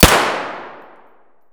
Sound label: pm indoor distant; Escape from Tarkov